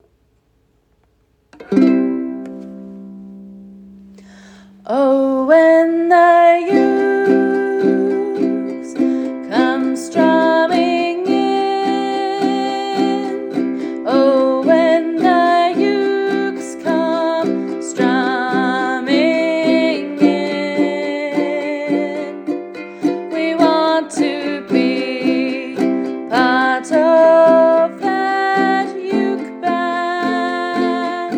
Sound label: Uke when the ukes; Uke book